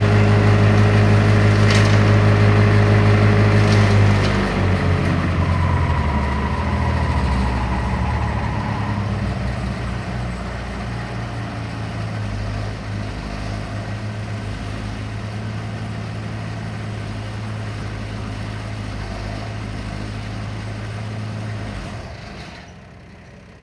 industrial machine wood chipper vermeer bc150; sound effects one

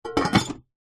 rice steamer lid on; household

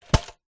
food noodle pot dried plastic set down; zapsplat

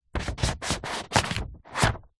foley paper sheet thick knife rub across; zapsplat

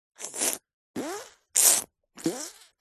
human hands wet squeeze together

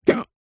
human male hiccup

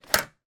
office file box metal latch close

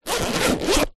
foley small pouch bag rigid fabric zipper; zapsplat

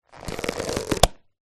latex glove pull off hand remove; foley

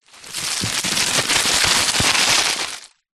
foley dirt collapse fall roll small pile; zapsplat

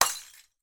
impacts glass bottle smash; martinimeniscus

